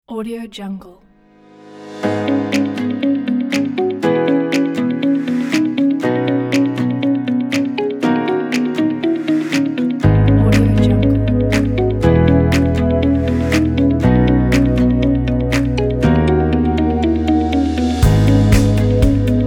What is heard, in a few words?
inspiring corporate background